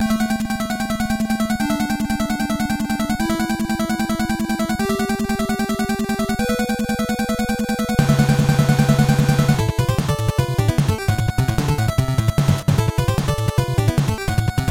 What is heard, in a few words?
stage waterfall; contra; stage3